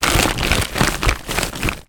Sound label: household trash rubbish in trash can compress squash down